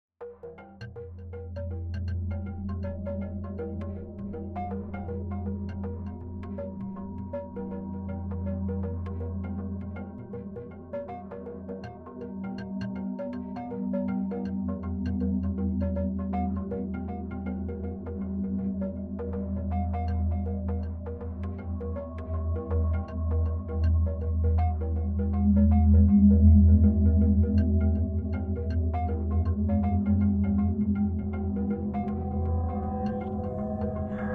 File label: Texture Tribal Layered Growly Damp; LYN115 Trailer Production Elements